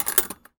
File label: Foley